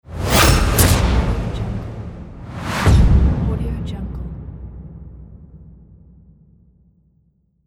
cinematic transition

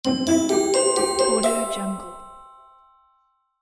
game success

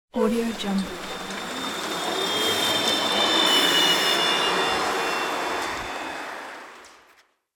electric bus driving off

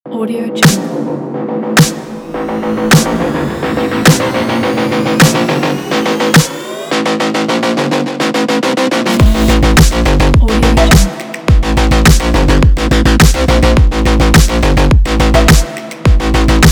cyberpunk action; onymusic

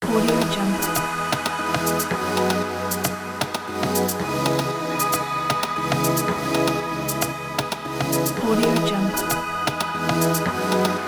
future electronic

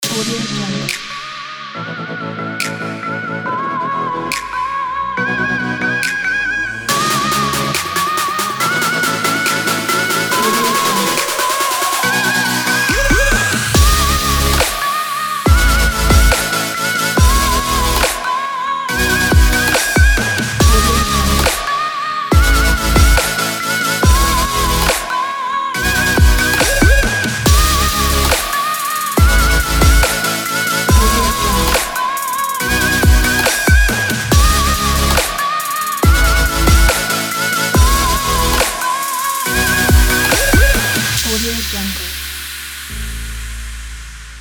the future bass

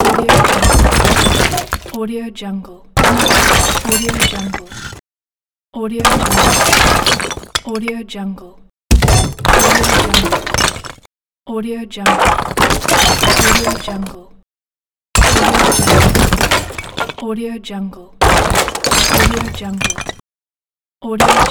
brick stone wood metal falling short pack

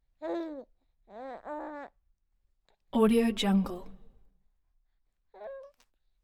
baby 15 week vox

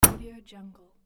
thump

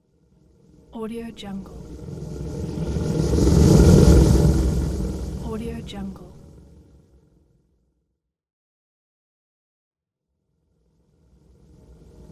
scifi fly by whoosh

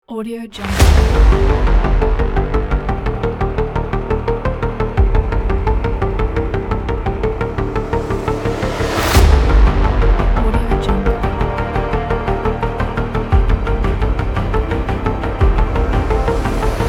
movie trailer

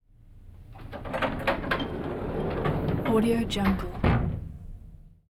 elevator doors open close; prosoundfx

